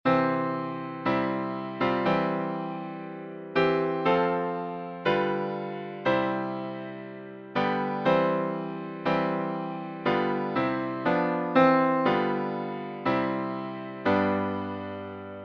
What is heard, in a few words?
Hymns